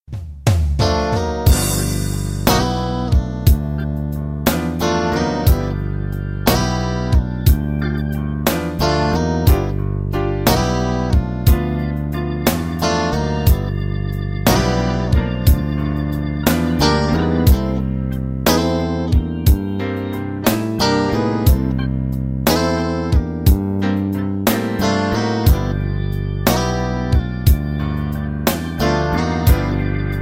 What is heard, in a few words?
Sliding